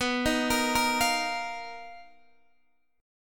B-Major Sharp 11th B down Guitar Open D

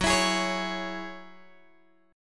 F sharp 7th Suspended 2nd, Guitar, Open G; x,11,14,13,14,11

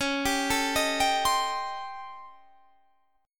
A 7th Sharp 9th Flat 5th

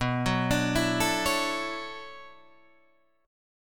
B Minor 11th B down Guitar DADGAD; arpeggiated

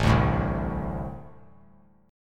A-Minor Double Flat 5th A; strummed; JGuitar

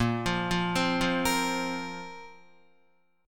A sharp Suspended 2nd down Guitar; arpeggiated; A#sus2; JGuitar

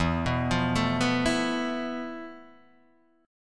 F Major 7th Flat 5th E, guitar chord

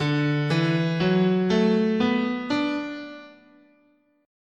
D Major Pentatonic, guitar, scale

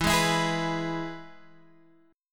E-Major E, Guitar Standard; strummed; JGuitar

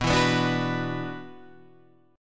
Gb 7th Suspended 4th Sharp 5th B

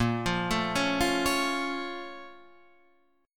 A sharp Minor Major 7th chord; A#mM7 arpeggiated; Standard; JGuitar

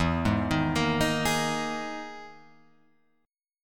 E-Major Sharp 11th E, Guitar Open F; EM#11 arpeggiated; 4,3,3,5,6,3; JGuitar

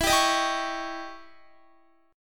E Minor Major 13th E down Guitar; EmM13 strummed

